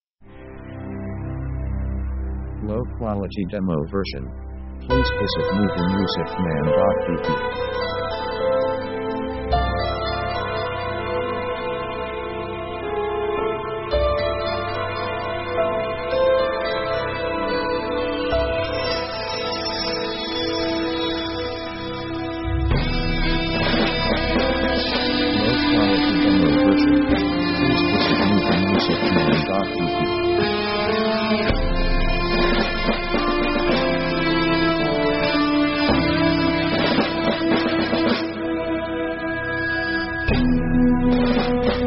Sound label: orc eternal march, orchestra